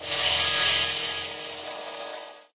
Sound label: lightbridge elec, footsteps